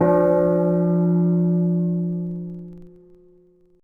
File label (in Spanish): Aviso de correo; sheol theme